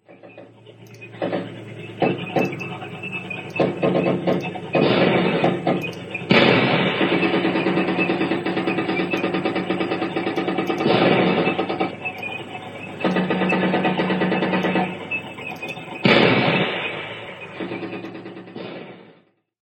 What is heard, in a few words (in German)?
Panzerschlacht